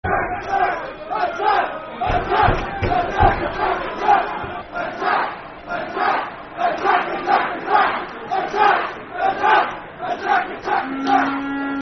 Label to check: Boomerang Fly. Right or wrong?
Wrong